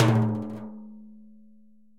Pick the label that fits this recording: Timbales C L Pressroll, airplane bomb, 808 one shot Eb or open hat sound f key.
Timbales C L Pressroll